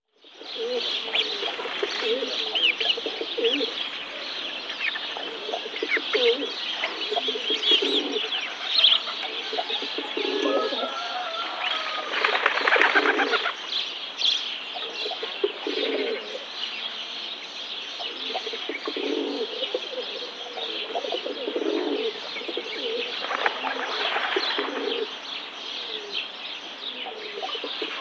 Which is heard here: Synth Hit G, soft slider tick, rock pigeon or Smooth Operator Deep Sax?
rock pigeon